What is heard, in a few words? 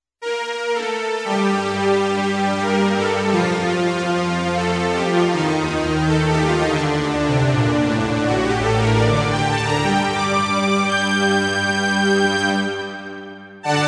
hi string